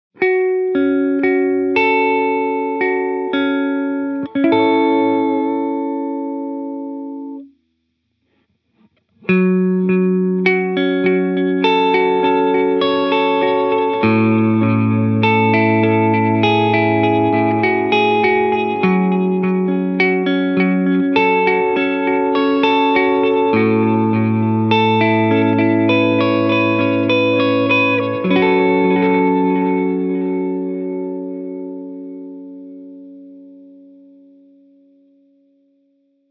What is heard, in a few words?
Tape Echoplex